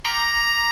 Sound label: ring bell; 音MAD; 蓝蓝路